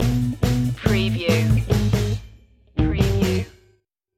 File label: old school detroit stinger